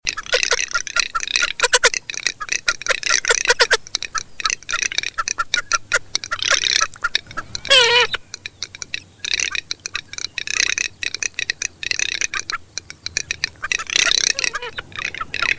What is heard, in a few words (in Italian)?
MARZAIOLA; CANTI SAG NATURE 2024